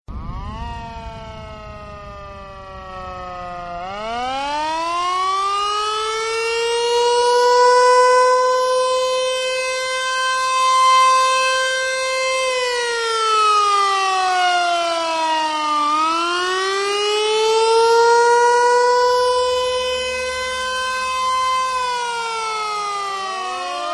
Sirena Sensation

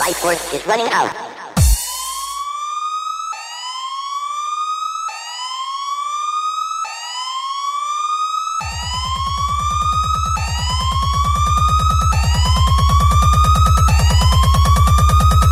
Ambulance

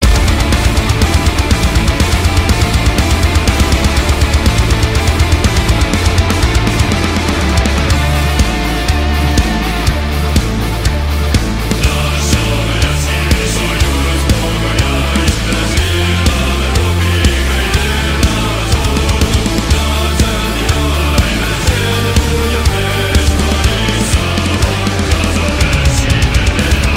Soviet march

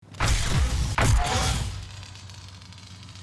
Kylo Ren Saber; BF2

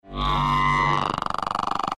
Demon Roar

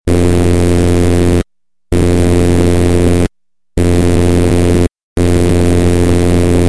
Turbo Vibra

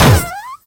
Rumble Spikes

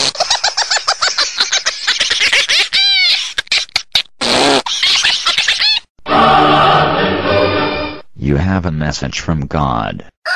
the laughing cat

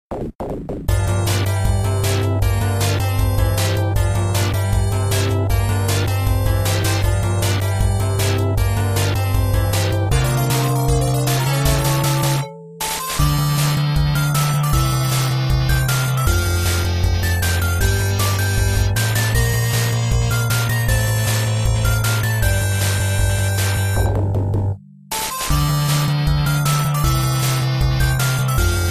Arcade; Double Dragon

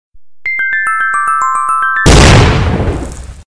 Samsung Bomb

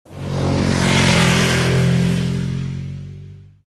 Sound Bike

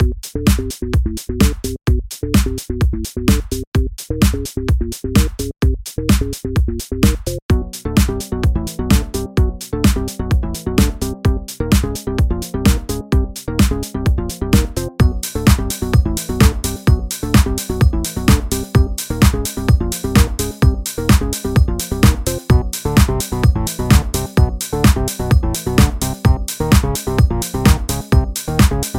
Trap

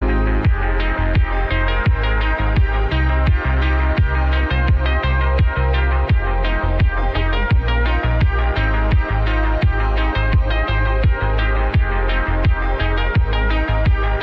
Resonance

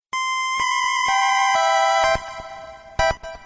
Creepy Tower Sms

